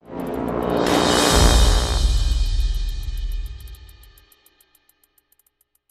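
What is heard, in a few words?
sting, cymbal horn cluster; transformers war for cybertron gamerip; IACON IN RUINS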